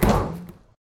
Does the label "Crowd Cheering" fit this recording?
No